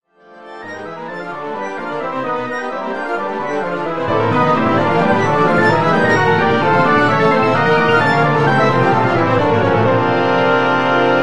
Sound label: Wind Ensemble